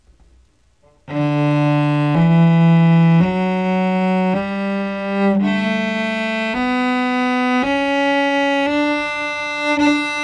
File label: D Scale